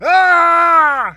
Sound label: anger